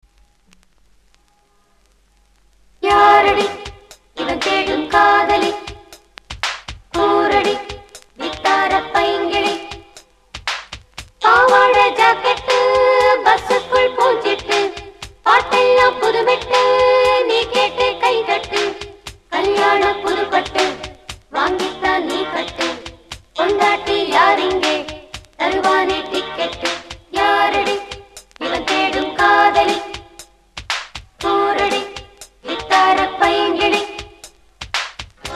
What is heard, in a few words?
Female; Pondatti Thevai 1990